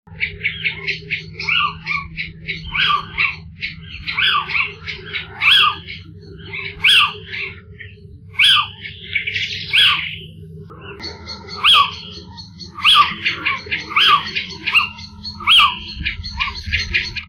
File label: little owl